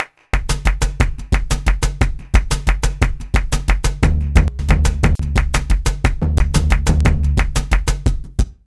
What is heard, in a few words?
Modern Percussion Loops, MPC60 119; Soundscan vol.56; AKAI